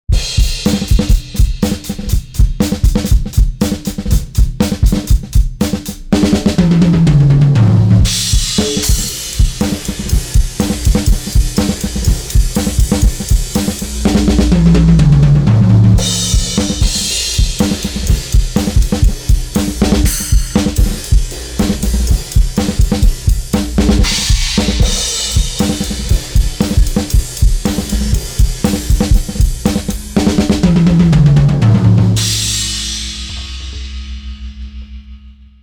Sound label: EQ Tone Stack Low boost; Kirchhoff